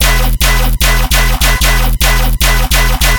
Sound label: bass loop 150 E; Virtual Riot